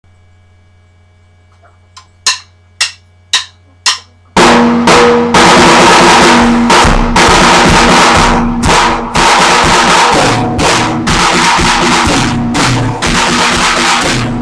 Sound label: DRUM SOLOS WITH A TAMBOURINE